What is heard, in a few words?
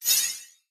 Bolt Release